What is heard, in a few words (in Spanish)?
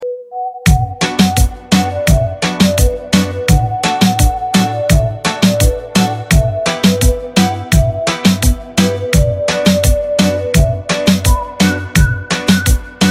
instrumental